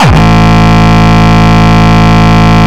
Longkick, kicks

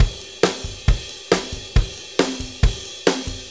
4-4 Loop, Drum Loops, Full Kit Loops

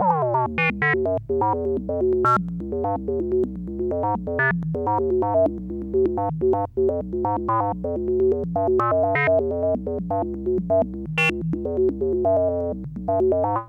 126, SYNTH SEQ; TEK S+H; AMG; Global Trance Mission VOL-2

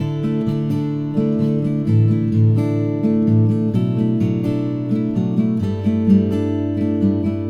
Acoustic Latin Guitar 128BPM Dmaj; Acoustic Latin Guitars; Vengeance; Essential Clubsounds Vol.4; Guitarloops

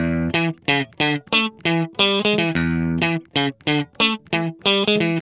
GUI 90 LICKS

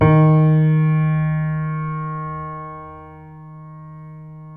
MF D#3, GRAND PNO

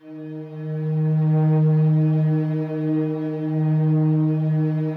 slow strings D#2, Yamaha VP-1; Music Store; 90's Sample CDs

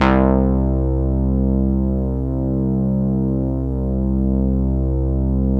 ODYSSEY Vintage Keyboards C2; Big Fish Audio - First Call Vintage Keyboards

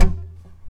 TAP, FLAMENCO Guitars

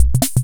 Loop, Hammond Auto-Vari 64; 300 Drum Machines; Processed

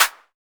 TEK CLAP; Drums In Your FACE!; CLAPS

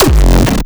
hard style, kick

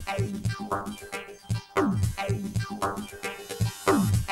TRANS LP, Syn. lps.; AMG; Global Trance Mission VOL-1

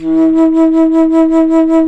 bass flute, vib; Roland L-CDX-03 Disk 1

